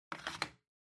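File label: Ceiling Light (remote control Holder Put In)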